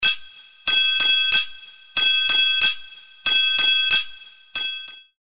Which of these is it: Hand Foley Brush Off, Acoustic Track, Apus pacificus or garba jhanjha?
garba jhanjha